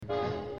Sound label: Diminished Isolated